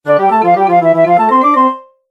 cartoon melody music sound effect background music